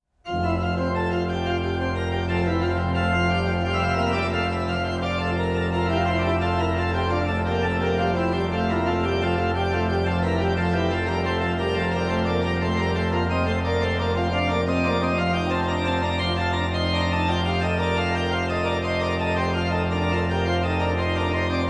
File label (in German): Toccata in F-Dur; Toccata und Fuge in F-Dur, BWV 540